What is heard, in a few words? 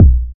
puffy kick drum b key, Royality free steel kick drum sound tuned to the B note